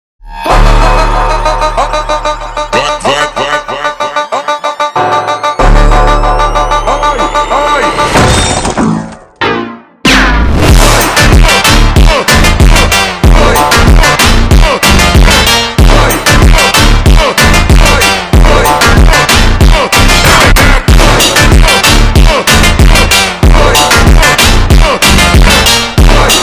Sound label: Funk super Slowed